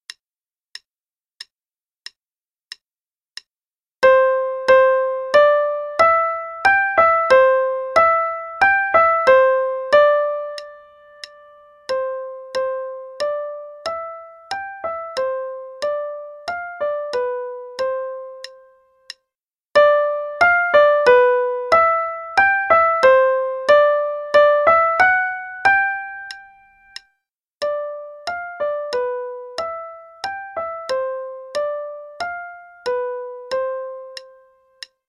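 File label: Play-a-long, Waltz, right hand only, quarter note=92; Kohler; Waltz of the Young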